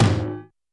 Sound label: Tom Industrial, YAMAHA RM1X